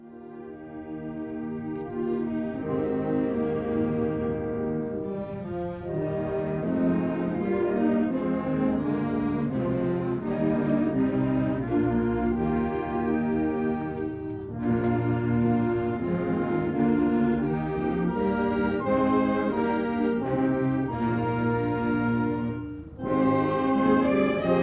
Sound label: fantasia of an irish hymn